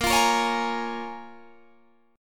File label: C sharp 6th A sharp